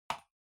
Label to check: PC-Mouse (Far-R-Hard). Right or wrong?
Right